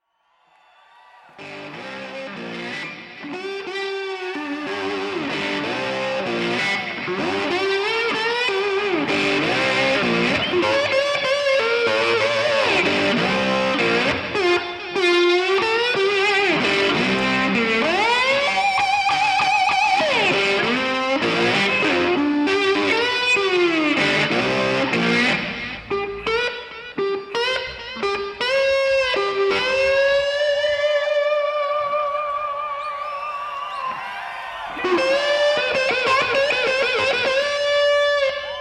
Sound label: Guitar Intro